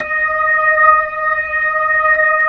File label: B-3, Jazz